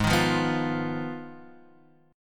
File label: G sharp Minor 6th G sharp down Guitar Open F; G#m6 strummed; JGuitar